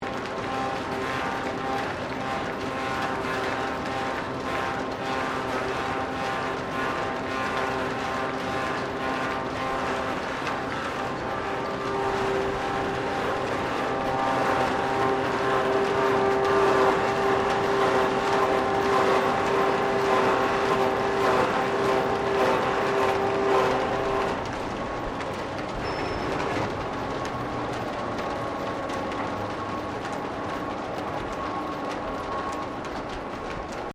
Wheelwright Spoke Lathe Operating, Colonial era machinery; Machines